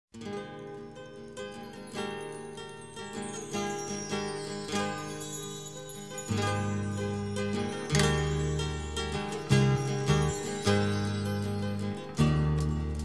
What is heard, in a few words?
Meditation Strings